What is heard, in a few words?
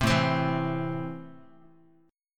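A Minor, Guitar, chord strum; Am strummed; 3,5,3,2,x,x; JGuitar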